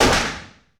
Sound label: Pistol Shot 9mm Semi-Auto; Weapons & Firearms; Sony Pictures Sound Effects Series Volumes 1-10; Sony Creative Software